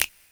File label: Perc, retro house samples, Drum Hits